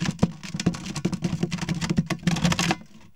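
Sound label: BOX RATTLE, Box Noises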